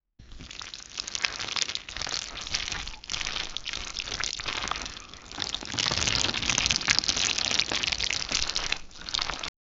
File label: mushrooms bobbing with so, organic